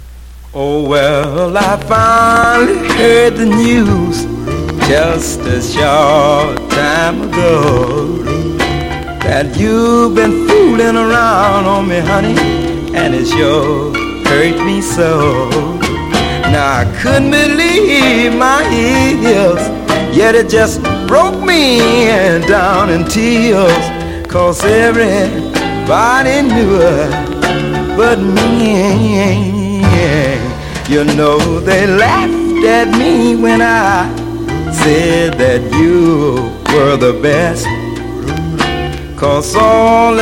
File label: singin